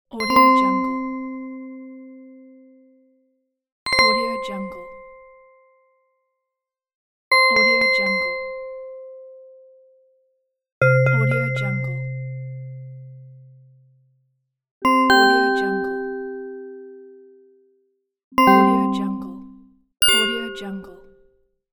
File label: interface pop up